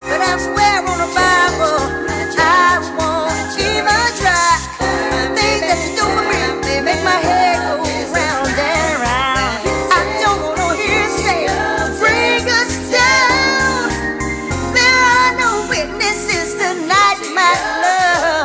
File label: City Of Jazz, ringtones; sky ringtone